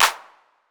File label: 808 CLP; Wizoo; Platinum 24 Electronic; PERC TR